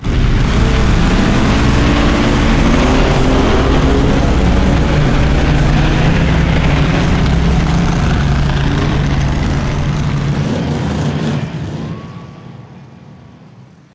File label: Bigtakeoff